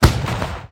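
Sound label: GRENADE LAUNCHER ATTACK; SFX GRANATOMET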